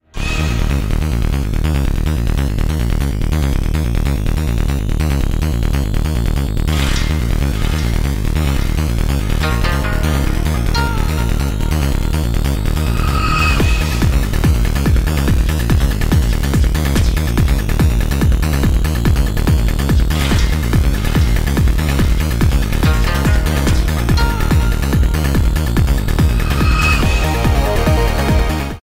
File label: Fast Music